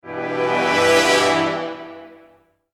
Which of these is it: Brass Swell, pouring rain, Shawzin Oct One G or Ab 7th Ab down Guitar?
Brass Swell